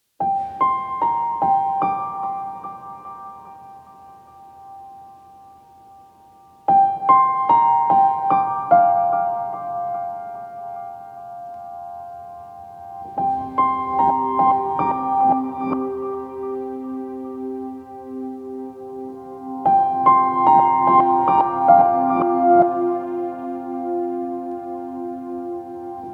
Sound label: Chill, Tension Slow; Chill V1